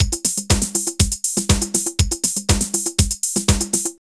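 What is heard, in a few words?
drum loops, right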